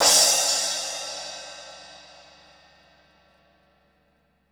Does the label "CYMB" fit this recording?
Yes